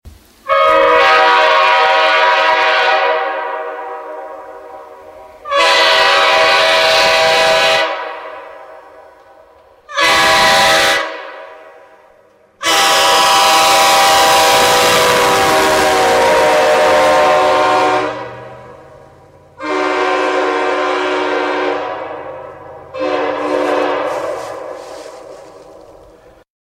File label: Airchime